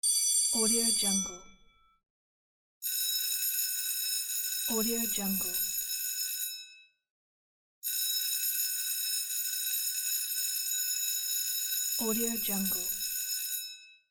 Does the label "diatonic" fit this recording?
No